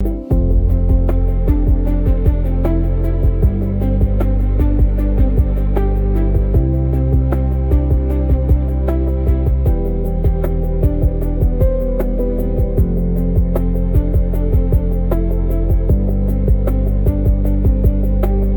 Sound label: loop; education